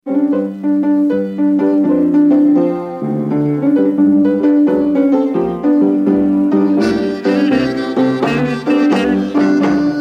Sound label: Leaving Blues, Vintage Blues; Fortune